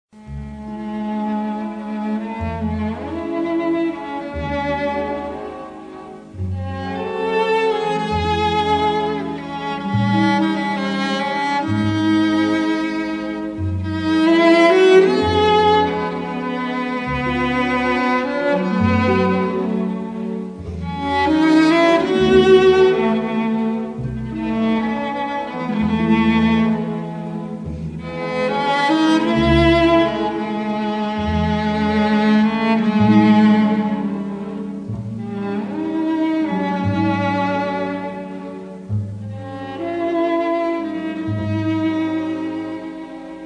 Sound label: Solo Viola; muzyka k spektakljam; gore ot uma; solo violoncheli